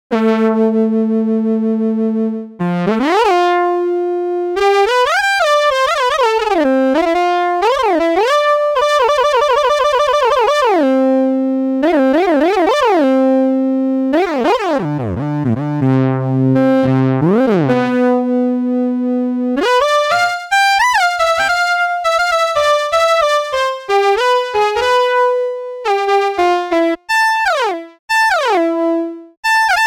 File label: Bad Bad Lead